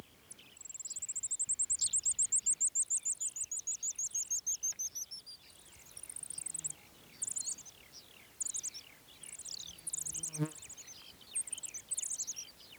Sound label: Ochotona dauurica song